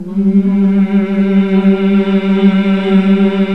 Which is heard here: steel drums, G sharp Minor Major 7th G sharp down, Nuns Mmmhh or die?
Nuns Mmmhh